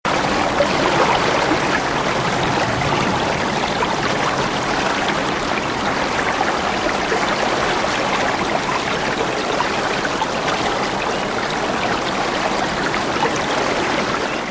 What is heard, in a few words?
rain